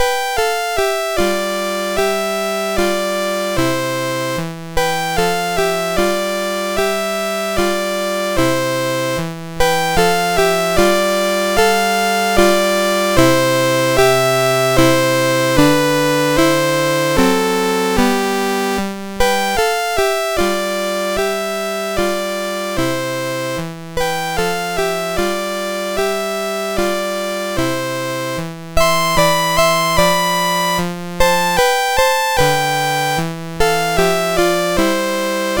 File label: Menuet, MOS6581R3; David W Tattam